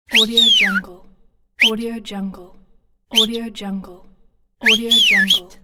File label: whisling